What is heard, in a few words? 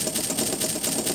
Hanging Block Loop; Shadow Temple; Zelda; OOT